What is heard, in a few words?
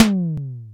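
R8-PRC, R8 percussion; E-Lab; X Static Goldmine 1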